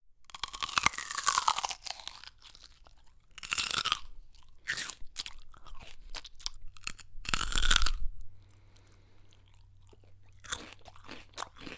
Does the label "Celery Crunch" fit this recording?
Yes